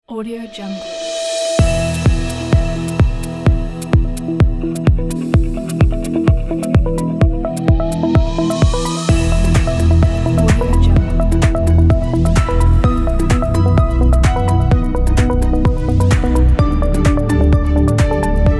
upbeat ambient inspiration

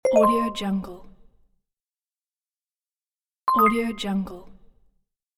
notification

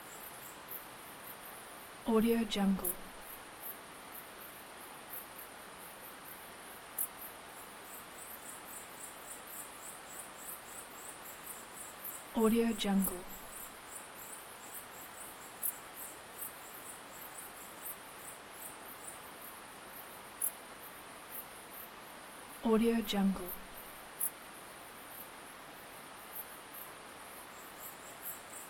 ambiance cricket chirping songs; blazznet

